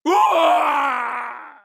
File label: impaled scream; mk9; nightwolf